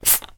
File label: food drink soda bottle slightly flat open fizz